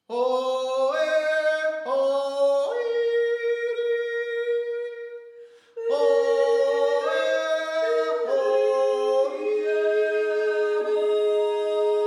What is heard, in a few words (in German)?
Steirer Dreier Alt